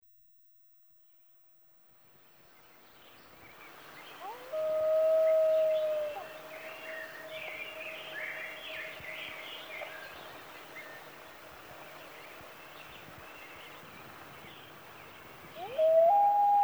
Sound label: Wails With Morning Songbird Chorus, loon